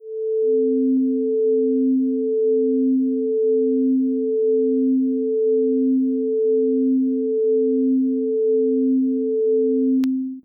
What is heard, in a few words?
Drones; Xerfan De Oliva Ana Beatriz